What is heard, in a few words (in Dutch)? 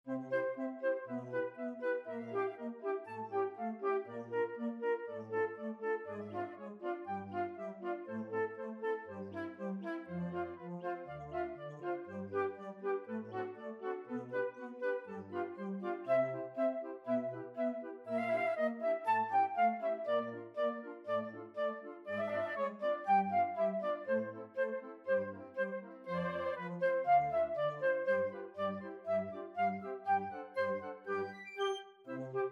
fluit; Finale; Carnaval des Animaux; Camille Saint-Saëns